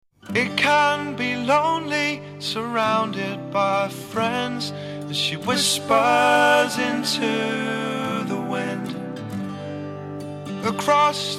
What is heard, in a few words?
whispers; city folk